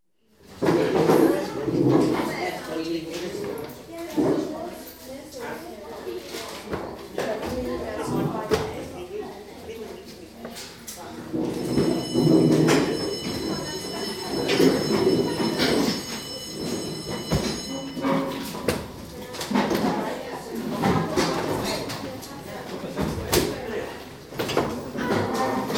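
General atmosphere at end of lesson, 5th year, bell rung, chairs moved and door banged; The BBC Sound Effects Library; Schools & Crowds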